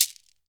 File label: SHAKER, Heart of Africa; Spectrasonics; Heart of Africa 1